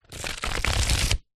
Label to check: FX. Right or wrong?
Wrong